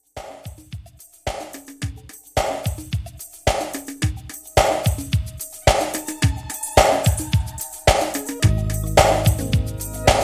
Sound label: 80s Pop Mixshow Re Drum 109